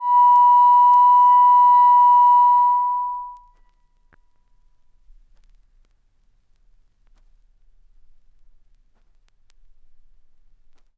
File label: rumble 1khz 10cmps ref, turntable tests